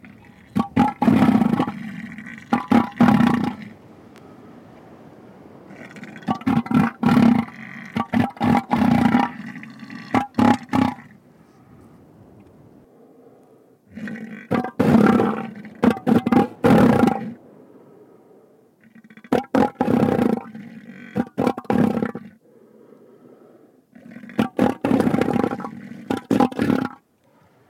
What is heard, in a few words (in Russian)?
morskoj lev